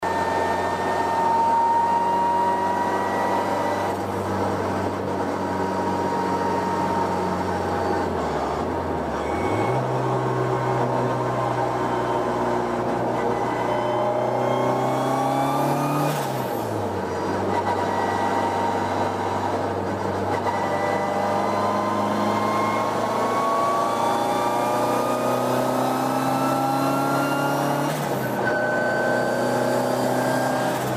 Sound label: mp5t sound